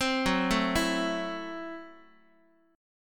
Gb Minor 7th Flat 5th Gb Guitar; arpeggiated; x,x,3,4,1,3; 4A,4E,5A,5D,5G#,6C#; JGuitar

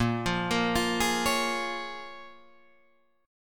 Bb Minor 7th, Guitar, Drop D

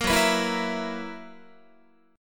F sharp 11th F sharp Guitar; F#11; strummed

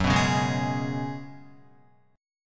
F sharp Suspended 2nd Suspended 4th, Guitar; strummed; JGuitar

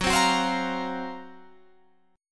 F sharp Major 7th Flat 5th

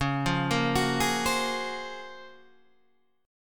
Gb Major Sharp 11th Db

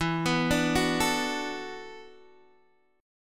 E 9th Suspended 4th E down Guitar Open D

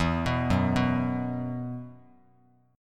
E Suspended 2nd E, down, Bass Standard; Esus2 arpeggiated

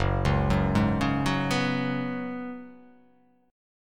F sharp Suspended 2nd Suspended 4th

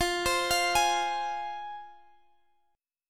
C Suspended 4th Sharp 5th F

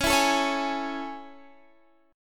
A Major 7th C sharp

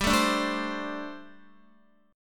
G Suspended 2nd Suspended 4th, Guitar; Gsus2sus4; strummed; x,10,7,7,9,7

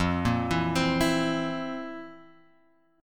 F Major 7th Suspended 4th, chord; FM7sus4 Chord {1 1 2 X 1 0} arpeggiated

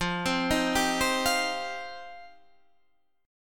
F 7th Suspended 2nd F down

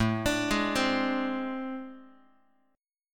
A-Minor Major 11th Guitar, AmM11 chord; arpeggiated; JGuitar

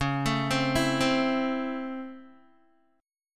Db Minor Major 7th Sharp 5th Db down Guitar; arpeggiated; 4E,4A,5D,5G,5C,6F; DbmM7#5

